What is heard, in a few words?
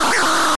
dragon